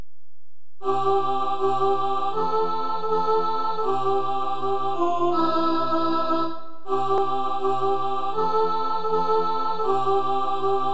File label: soprano, choir